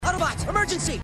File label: Emergency; Koji; Robots In Disguise television show